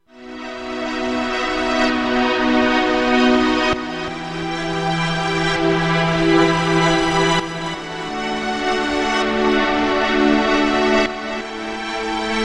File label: pad reverse, synth access virus